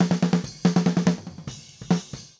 Multimic Facets Snare Over

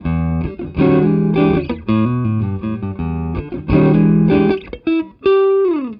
Gtr Mix; Mixing Secrets

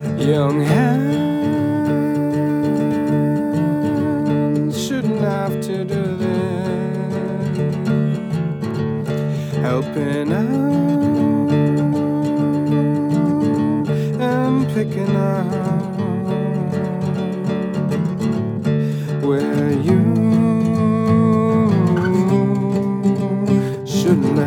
Ac Gtr Trans X

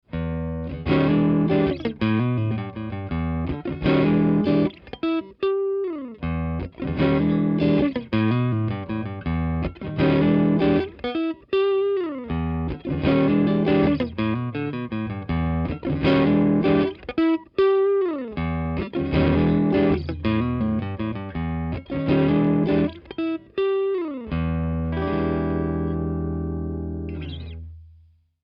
Multimic Room Elec Gtr SM57 Cls

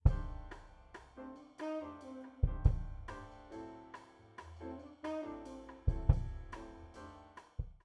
Kick Mix Proc HPF EQ

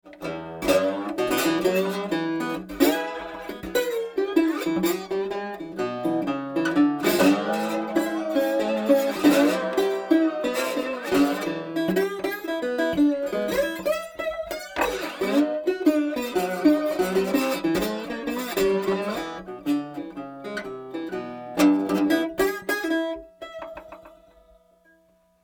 Dobro One Mic; Manufacturer Audio

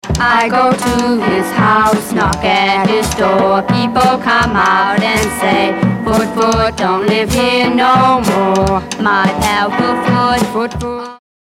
Droning

